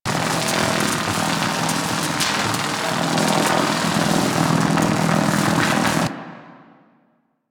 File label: Galleon Electricity Loop; Ambience; Warframe; Grineer Galleon